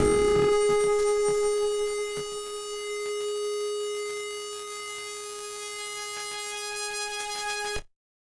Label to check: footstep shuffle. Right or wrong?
Wrong